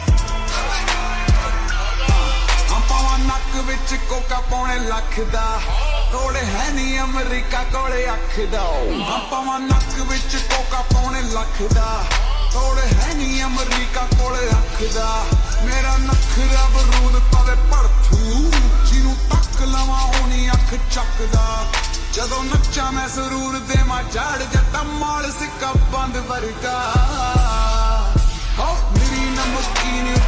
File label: Ringtone Slowed Reverb